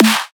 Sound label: Hard Snare; Drums; Antidote Advent